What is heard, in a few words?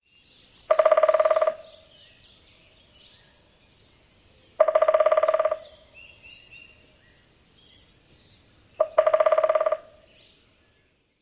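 drums, Red-bellied Woodpecker; KY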